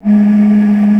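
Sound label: JAPANESE FL, BAMBOO FLUTE; Voice Crystal - Steve Reid Definitive Percussion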